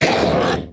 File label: stomper warcry, enemies; Factorio